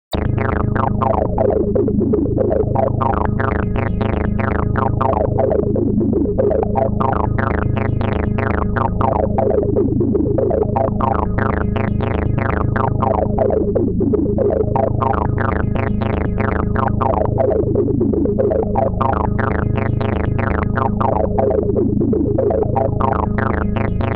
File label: MonoBass; Grid2